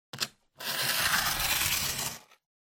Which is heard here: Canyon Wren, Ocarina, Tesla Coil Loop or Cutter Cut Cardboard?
Cutter Cut Cardboard